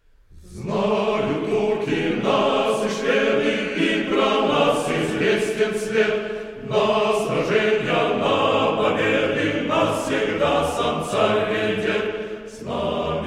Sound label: preobrazhenskij marsh